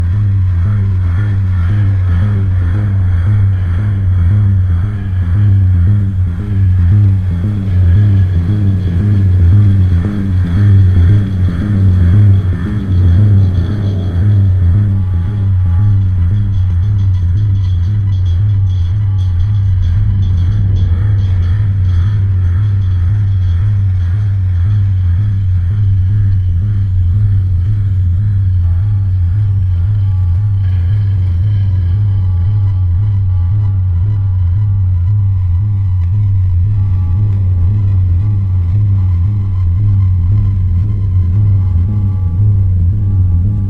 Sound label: hardmode ambience; dungeon; zombiesurvival